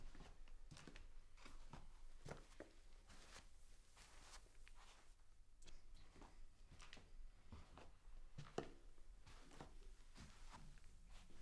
Steps Wood Carpet

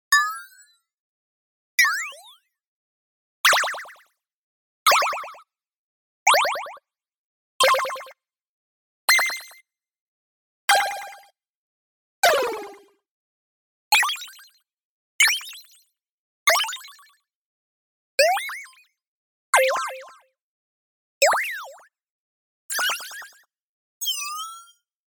Magic Sounds

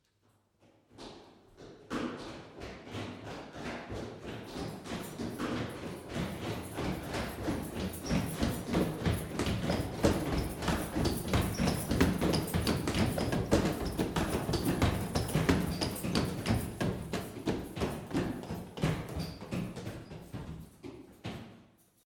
Multiple Police

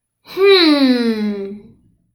Hmmm Got An Idea

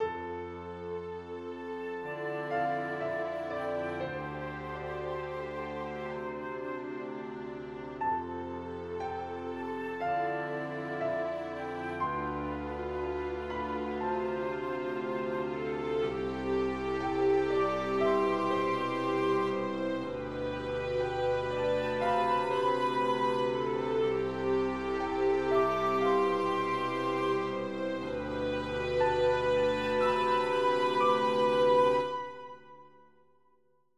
Sad Film Symphonic Loop

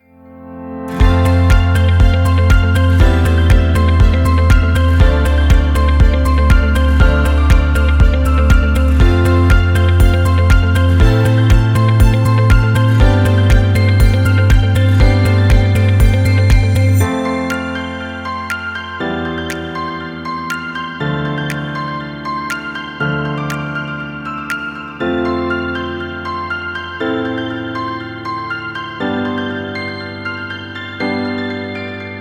Upbeat Corporate Short

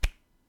Body Touch

